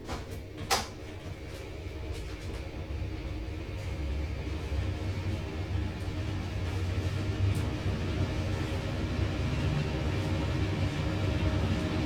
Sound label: car wash; best service Blue Box